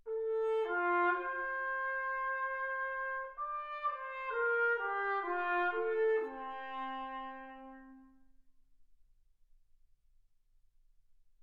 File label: trumpet